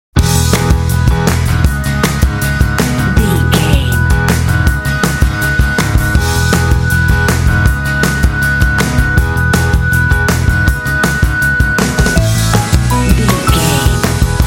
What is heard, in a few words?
pop upbeat happy fun